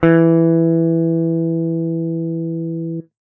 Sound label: guitar electronic, clean electric guitar